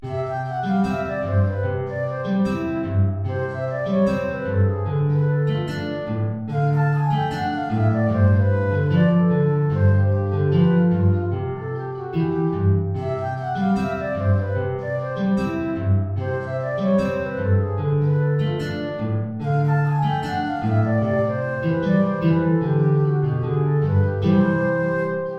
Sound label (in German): Querflöte Gitarre